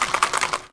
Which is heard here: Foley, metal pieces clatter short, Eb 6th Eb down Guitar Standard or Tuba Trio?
Foley